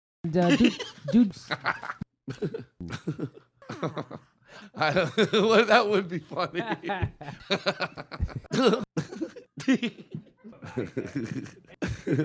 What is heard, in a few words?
laughcast